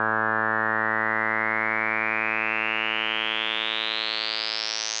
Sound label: pulsars simple